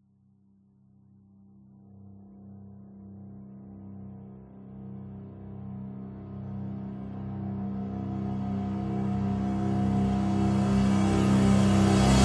sound design cinematic riser reversed orchestral hit